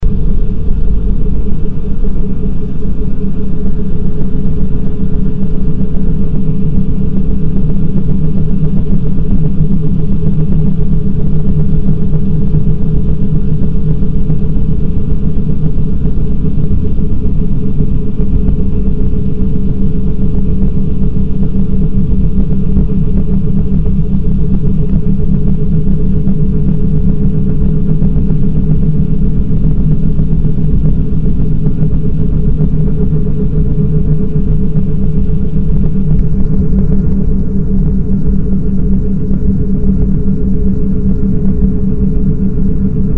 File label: Deep Metal Casing; Sounds of a Dying Library